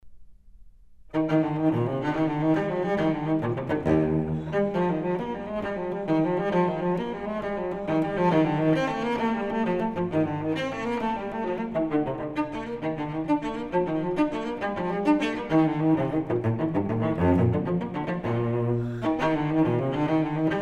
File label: Suite for solo cello No. 4 in E; Bach; Cello Suites